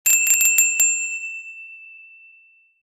classic hotel call bell

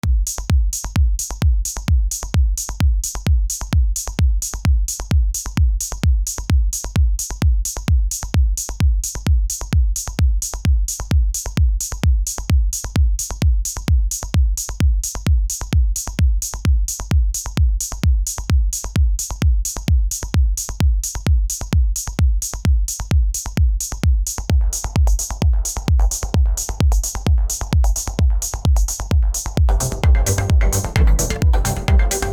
techno house

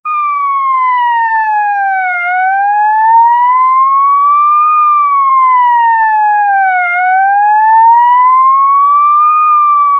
police siren

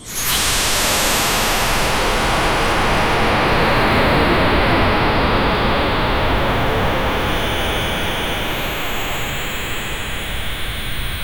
dark magic spell sound wi

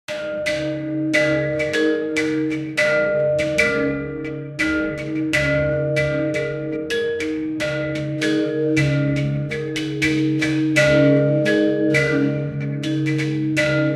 generate mystical instrum, veena

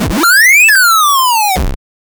jump boy for the computer

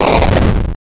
8 bit explosion sound

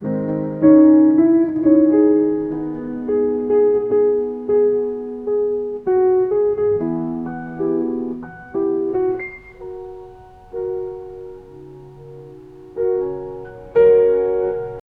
lazy background boring, boredom

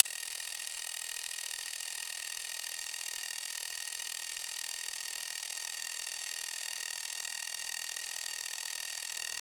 a bunch of cameras taking

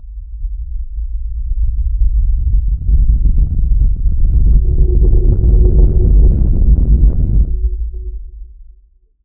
deep ominous rumble; mysterious

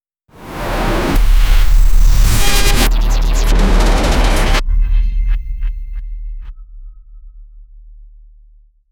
cinematic logo, bass hit

